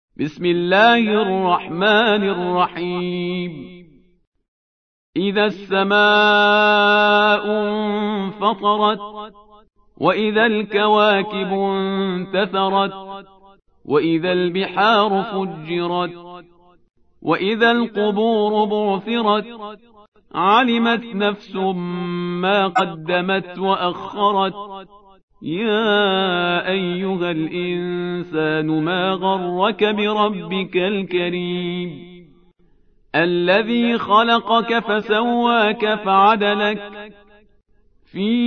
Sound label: infitar surah